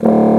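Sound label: Contra Bassoon, D0; Digital Sound Factory Virtuoso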